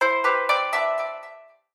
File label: Fountain, notifications